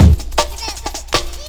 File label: break